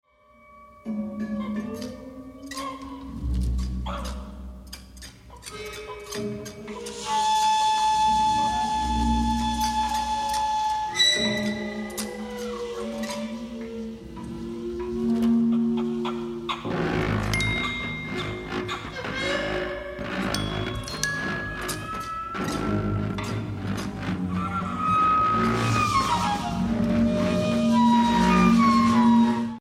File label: Improvisation Group